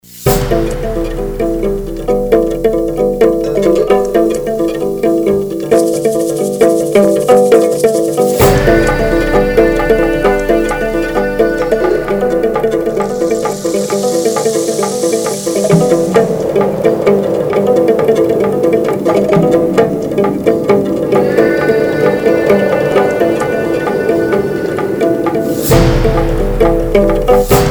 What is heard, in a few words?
african village